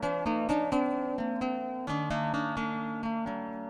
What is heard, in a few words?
guitar, 130BPM; Pony Fan Music Remix Stems